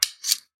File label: old camera sounds; soundscrate